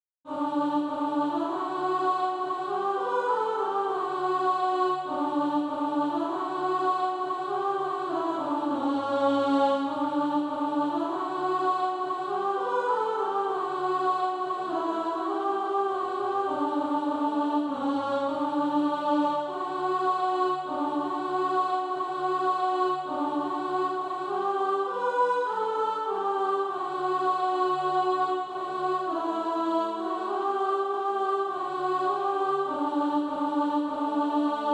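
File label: alto, Practise Music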